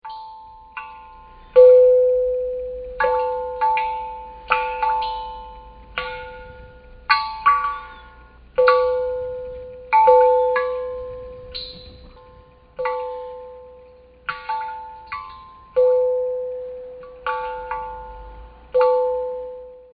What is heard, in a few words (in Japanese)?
suikinkutsu, 水琴窟という日本人が発明した雫の落ちる響く音を発生させるもの; 鳴り砂の音は、どんな音？